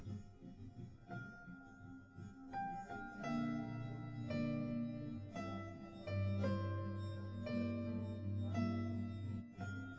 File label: nodrums, live rec